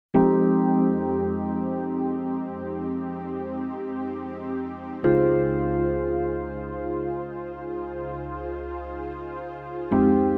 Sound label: Slow Rain ohne